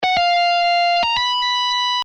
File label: slide